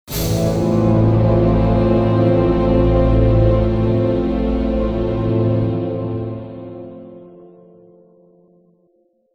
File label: Success; Sniper Elite Berlin 1945